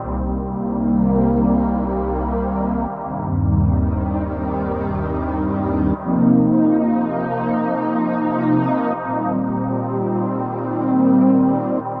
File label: synth pad ambient 160 C; Sphere